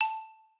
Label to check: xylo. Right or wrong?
Right